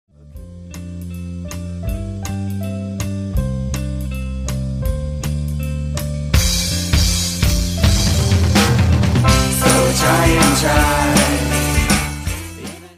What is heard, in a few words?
drum